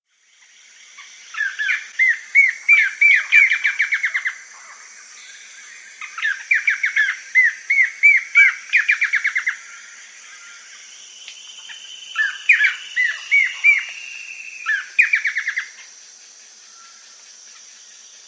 Blackish Antbird; S. America

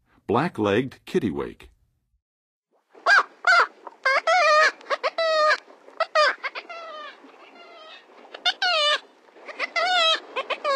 Black-legged Kittiwake, Birds of North America; Western USA; Western USA Songbirds

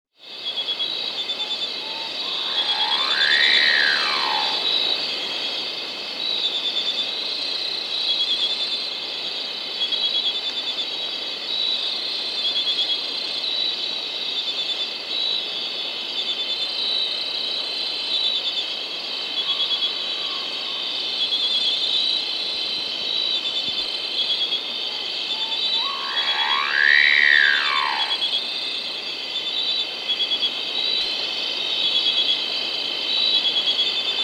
Wattled Guan (Aburria Aburria), Andean Birds; S. America

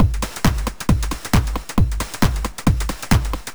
Drums Techno

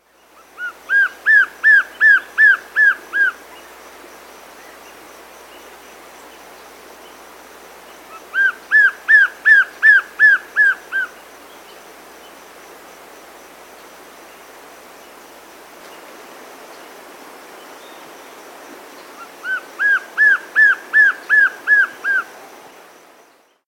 White-Bearded Antshrike, S. America

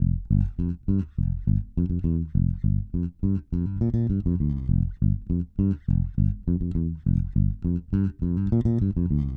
Bass Hip-Hop

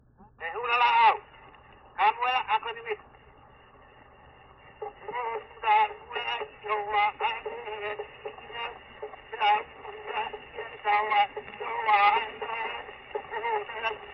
Name chant for the chiefess, Hawai'i; World Music; Hawaiian; Manono; Na Leo Hawai'i Kahiko - Voices of Old Hawai'i

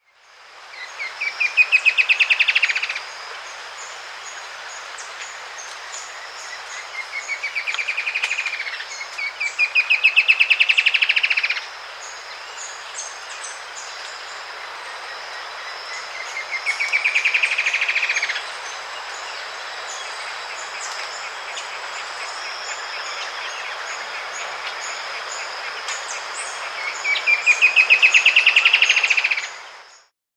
Pygmy Antwren, S. America

